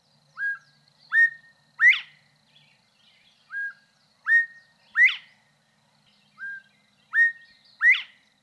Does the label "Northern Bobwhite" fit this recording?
Yes